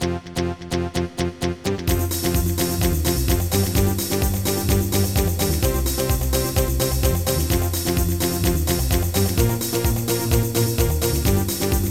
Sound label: upbeat